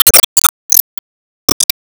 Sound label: Enemy Big clam Cell Open